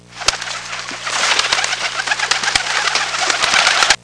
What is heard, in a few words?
Pigeon sounds